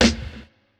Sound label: Kit, breakcore Drum Hits